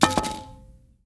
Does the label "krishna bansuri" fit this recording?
No